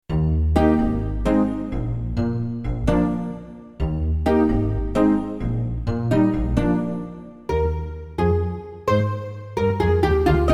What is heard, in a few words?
vc 1 nylon atmos